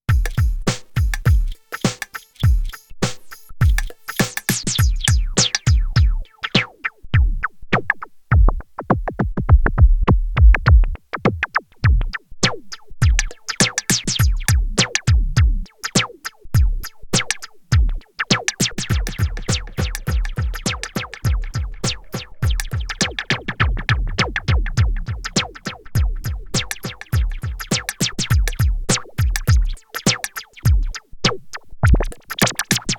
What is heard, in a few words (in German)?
Externer Beat durch VCF und Echo